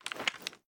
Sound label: reload empty xmag up; gmod; pi mike